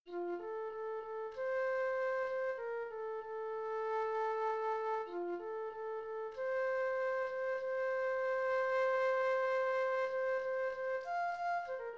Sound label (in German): Flöte